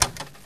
Switch On

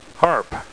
harp

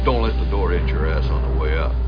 door hit; StargateSG1

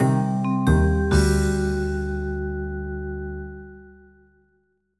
dark jazz

